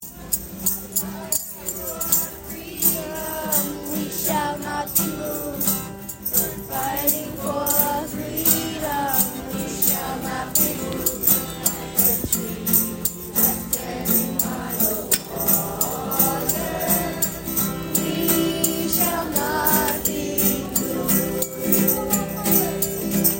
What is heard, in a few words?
Intergenerational Singalong NO KINGS protest Grand Army Plaza Brooklyn; No Kings Protest, Prospect Park, Brooklyn, NY, USA on Saturday 14th June; stand in the rain alongside neighbours and elders